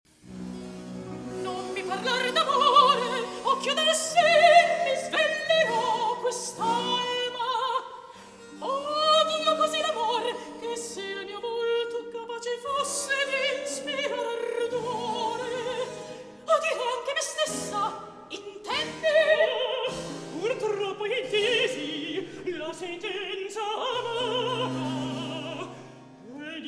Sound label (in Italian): Recitativo; Non Mi Parla D'Amore!; Polifemo